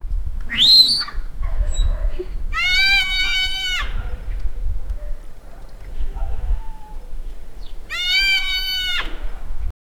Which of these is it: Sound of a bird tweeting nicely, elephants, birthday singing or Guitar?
elephants